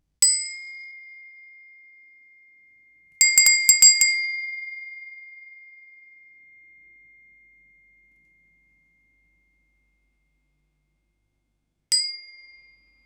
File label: bell diameter 20 meter